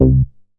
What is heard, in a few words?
Moog; leo-sounds